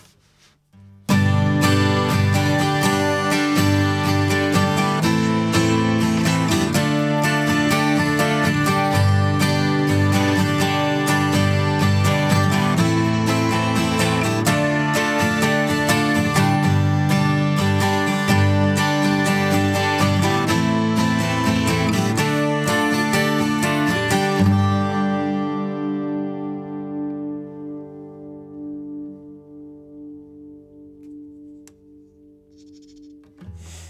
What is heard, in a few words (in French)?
comp bourrin eq; Neve 8801 avec compresseur et EQ réglés plus durement; guitare; AMS-Neve